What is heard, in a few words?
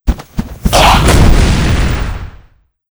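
lava demon attack, monster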